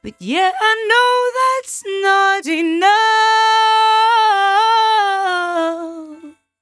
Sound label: 57bpm Am, Velvet Vocal; Zero-G